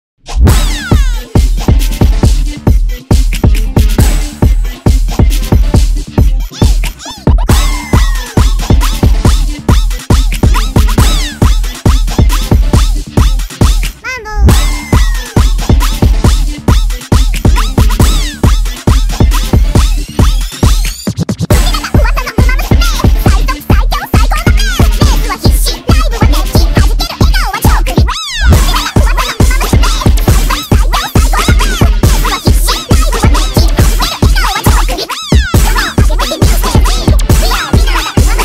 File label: rap remix sped up